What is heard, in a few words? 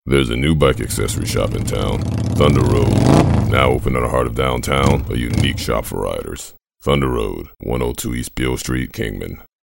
Thunder